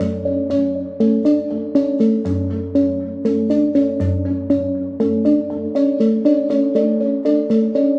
bouncy contemplative melody, synth and electronic loops